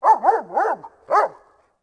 DOG, residential; zones

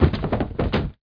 FALL

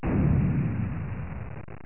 EXPLODE; lands

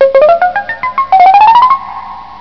Scale Hi; HyperStudio

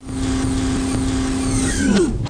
Monitor Down

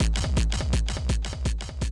DRUM SHOT HOUSE 125; eJay; Singleshots

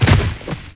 CHUTE; Dkedemo

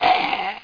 VOMIT

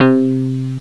bass, string instruments; Sound Effects for Multimedia - Volume 1

